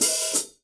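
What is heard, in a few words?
open hi hat, Real Drum Kit, FUNK KIT; Best Service ProSamples vol.43 - Real Drum Kits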